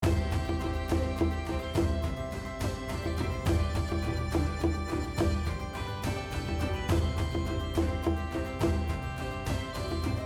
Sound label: Pick Scatter Background, slots; goldenClover